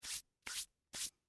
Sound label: Hand Wipes, Human, Hand, Wipe, Three, Times; Miscellaneous Human; BlastWave